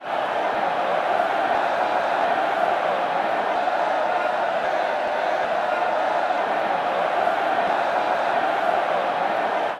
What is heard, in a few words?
stadium buzz